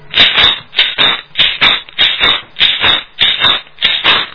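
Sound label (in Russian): маракас перезвон размеренный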